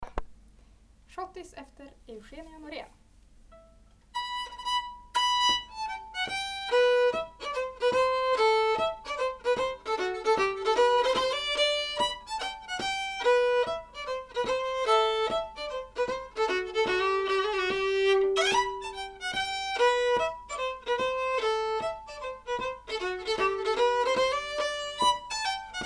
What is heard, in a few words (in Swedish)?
Schottis; efter Eugenia Morén